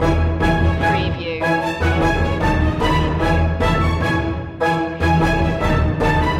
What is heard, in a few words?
neon nightscape distance loop